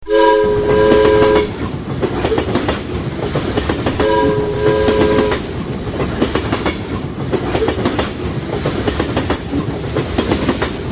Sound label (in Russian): Zvuk vnutri poezda gudki